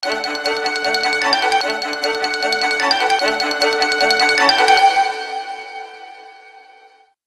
Classical, phonetones, Motorola; Karma-QA1

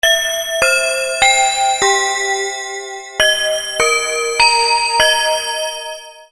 Glass House, phone tone; PlusFourSix; Sania; Lars Edvall

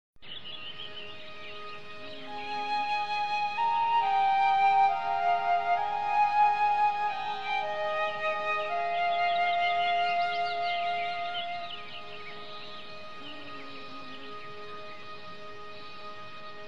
Wood background music; Nokia; Audio themes

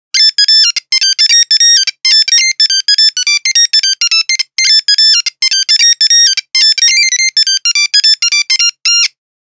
Tap Dance, Nokia Tones; Windows Phone apps

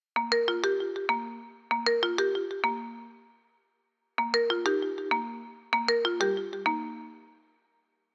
Marimba

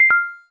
cam stop

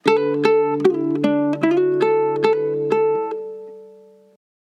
sms guitar; Mudita Pure

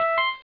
Charger Connect; Palm Treo 800W

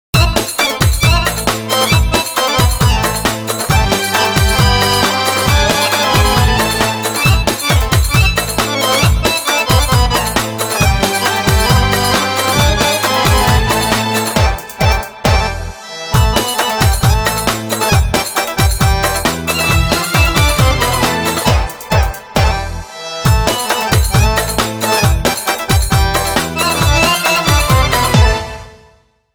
Sharqi, Middle East ringtones; Nokia

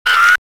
Mech. Sound Zoom In; SKY IM-S610K; Camera

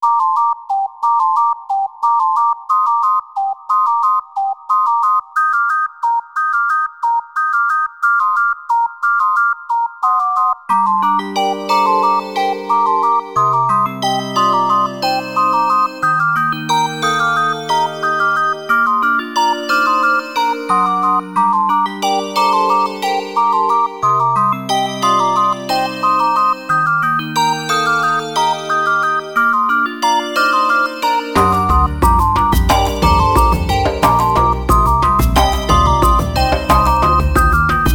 Polar Alarm; Sony Ericsson; W508; PlusFourSix; Brittany; Lars Edvall

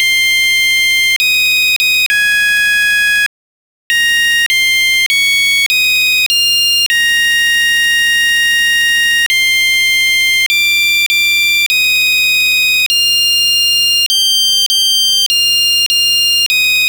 Canadian Anthem, Kyocera monophonic phonetones

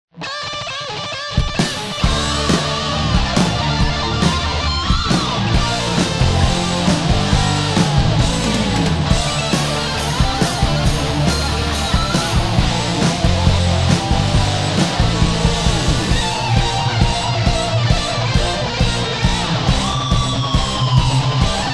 80s metal, ringtones; Microsoft; Windows Mobile; Individual download-only (2009)

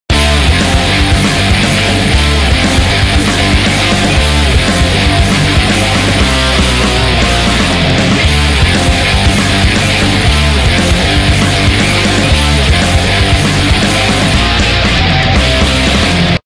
Overdrive; Kyocera; DuraXV-LTE

